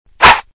slap

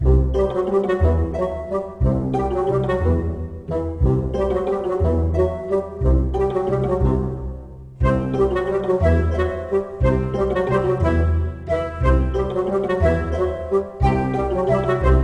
game music; bfdi physics toy

